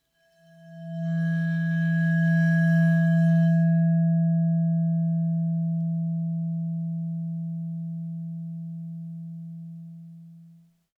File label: Vibraphone bow E3 stereo; MIS Pitches - 2014; Percussion